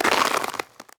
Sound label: rubble land; Golden Crayon Sounds